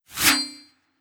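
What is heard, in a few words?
Trident swoosh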